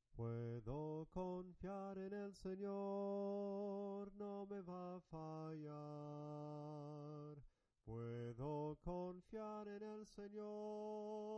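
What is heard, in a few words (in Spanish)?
PUEDO CONFIAR EN EL SENOR SOPRANO CANTADO, himnos en piano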